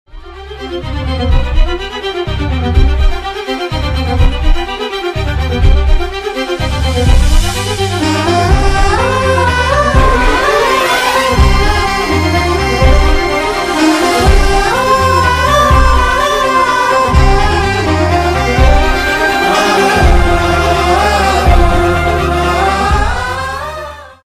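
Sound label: sad background music ringtone love failure love background music; Pranaya Vilasam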